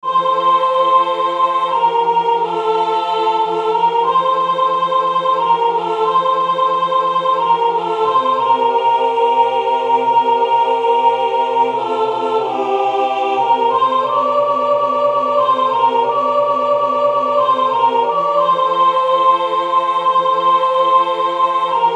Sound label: soprano